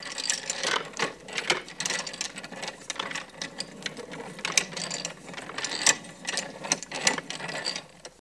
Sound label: Gurneys Wheels Roll Small